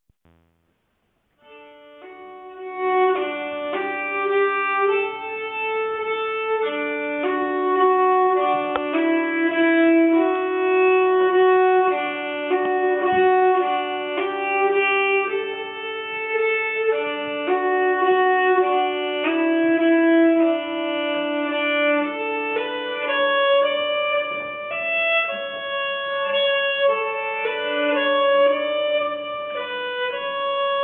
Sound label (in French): valse, nyckelharpa